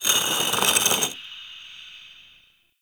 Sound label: Stone Small Scratches; Warframe; Ambience; Entrati